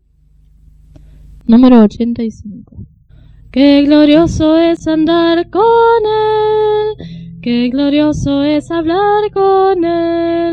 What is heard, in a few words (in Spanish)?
Coro, himnario niños